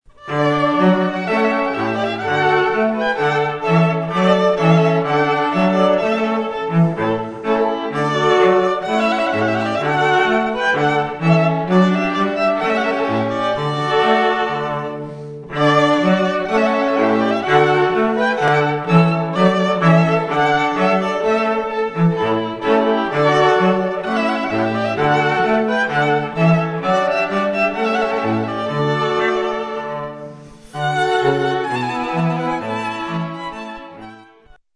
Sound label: Trumpet Voluntary; Clark